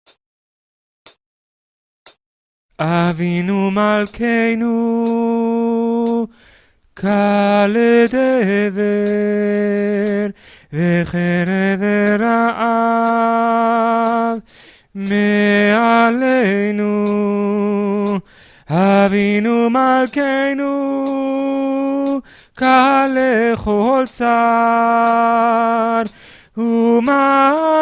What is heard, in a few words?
avinumalkenu tenor, choir